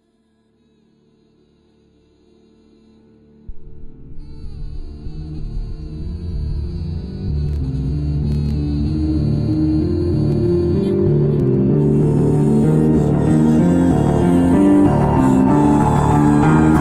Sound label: Metal fan music; MLP